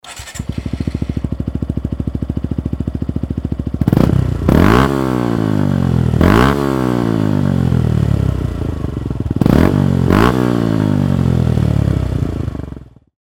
gixxer; bms-r

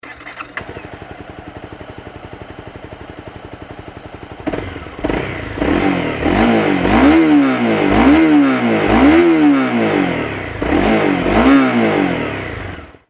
cbr250r

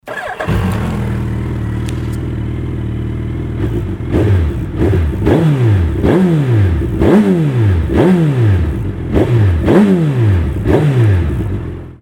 hayabusa custom bf2

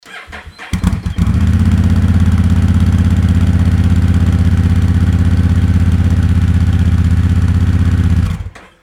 rebel1100 moriwaki niwa; sc83

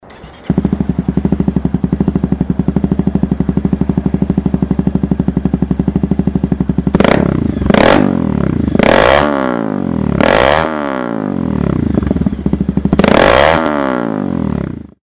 crf250rally

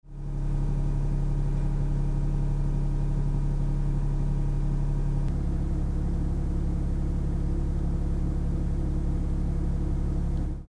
fe13 note aura soundproof

